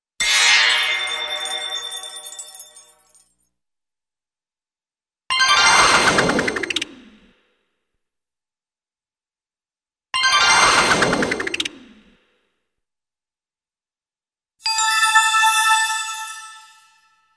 Gong Scrape & Metallic Chimes - Medium-high Pitched Keyb; Cartoon; Hollywood Edge; Cartoon Trax